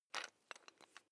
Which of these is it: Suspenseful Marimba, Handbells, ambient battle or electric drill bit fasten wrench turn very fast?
electric drill bit fasten wrench turn very fast